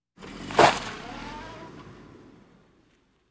Automobiles various; Valentino Production Sound Effects Library CD50

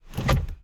driver foley wheel turn; Blind Drive

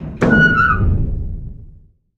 Hatch Large Metal Open; SFX Kit; Doors

